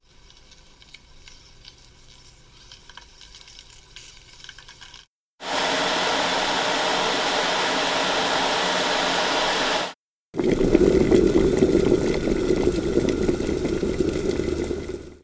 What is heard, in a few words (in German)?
wasserkocher rauscharten